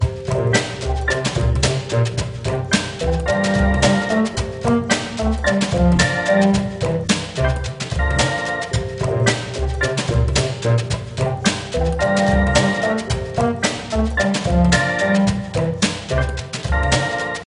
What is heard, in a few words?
Jungle Level